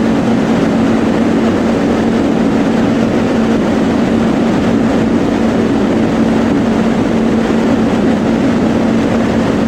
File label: Aircrafts A-10 Cockpit Plane Wind In L; DCS